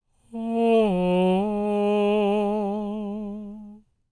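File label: falsetto, Vocal Planet Jazz FX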